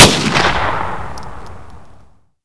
thompson shoot

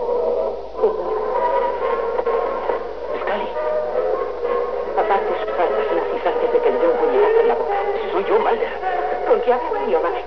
triangle

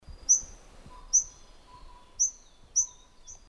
White tailed Robin

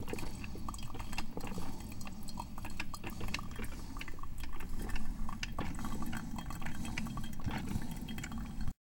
water

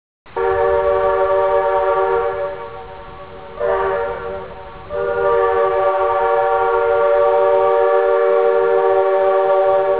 Diesel Horn

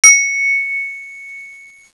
chime

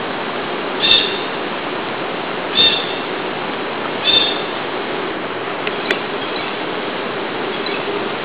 blue jay; birdsongs

